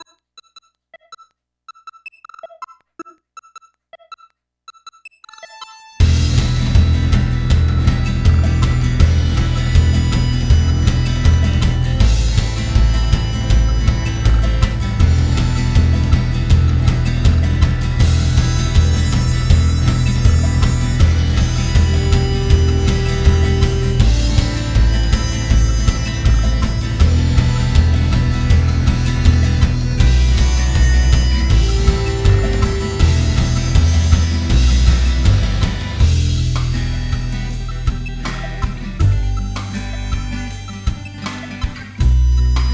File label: Catacombs; Son of a Witch